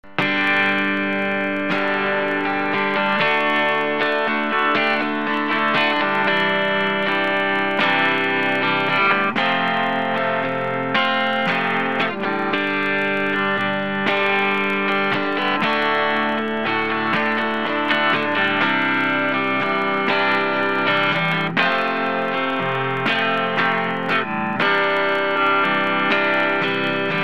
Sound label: CS-3 mod clean